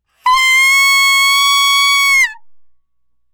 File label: kyaa; gaya